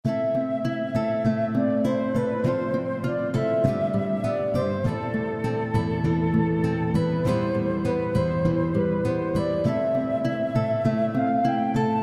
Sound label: ballad